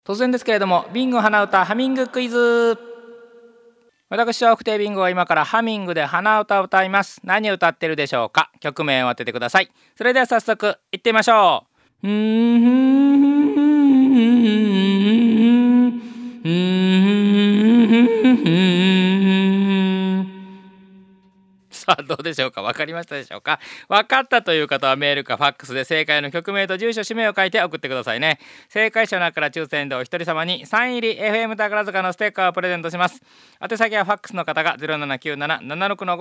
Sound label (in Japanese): hanauta